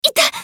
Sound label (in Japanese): 被ダメージ; カリュケ